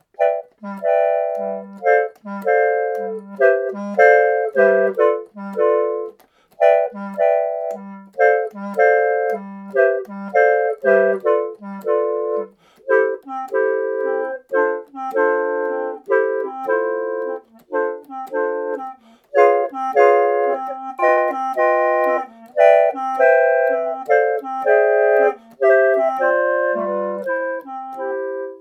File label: claviola